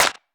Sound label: old school small reverb clap one shot f key